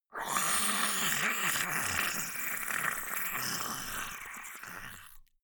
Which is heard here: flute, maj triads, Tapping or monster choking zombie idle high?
monster choking zombie idle high